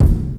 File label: Kicks Loud